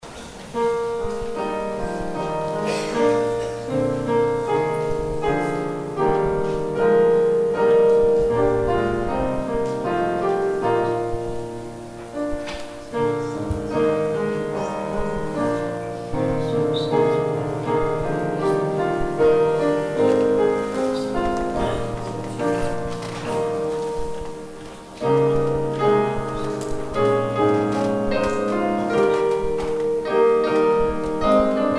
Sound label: piano outro; Piano exit music